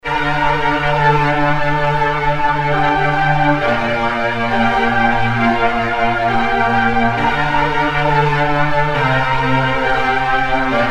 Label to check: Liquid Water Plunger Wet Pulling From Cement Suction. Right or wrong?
Wrong